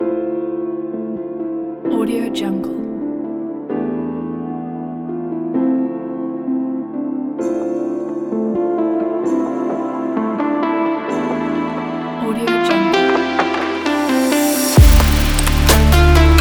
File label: a cinematic electronic; abigailstudio